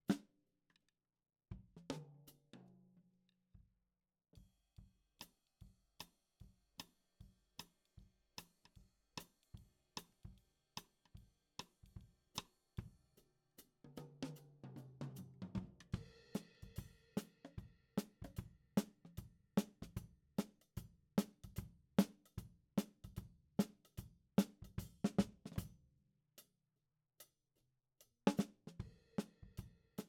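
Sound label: Snare top, Ragtime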